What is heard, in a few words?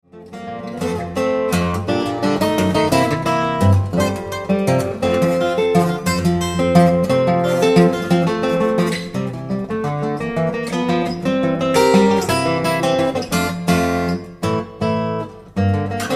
Waltz